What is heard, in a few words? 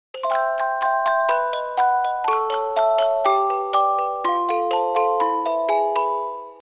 clavinet